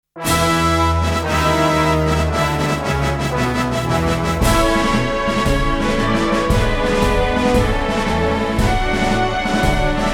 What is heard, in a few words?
fanfare; llylgamyn saga; wizardry